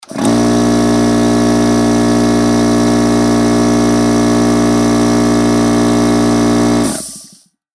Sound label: air compressor on and off